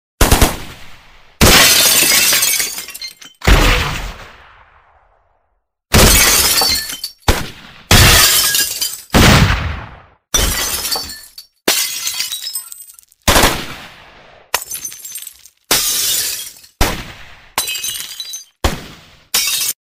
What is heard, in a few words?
weapon mix ringtone, hip hop